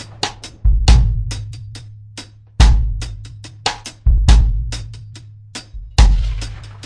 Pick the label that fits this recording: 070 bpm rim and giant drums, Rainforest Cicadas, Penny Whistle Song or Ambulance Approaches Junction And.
070 bpm rim and giant drums